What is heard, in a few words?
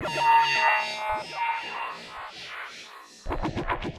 Atmos Sting; musicradar